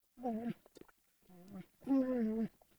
Wolf Idle Friendly Moan; Animals; Vocals

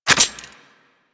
Scoop Idle Attach; Missions; Tenno Ball; Lunaro

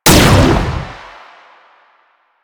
Gunbrella Shot 3D, Weapons; Warframe; Tenno; Koumei

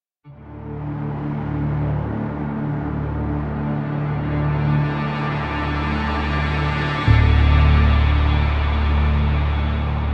Hub Amb Cue, Music; Dynamic Music; 1999

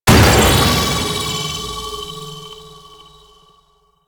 Prism Explosion; Warframe; Warframes; Harlequin

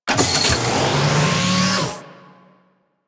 Charge; Grn Ogris

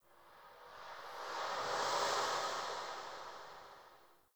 Fey Eldveil Latent Random, Powers

